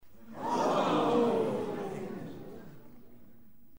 Crowd Gasp

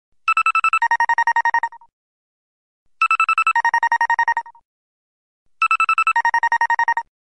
Multiple Ringing

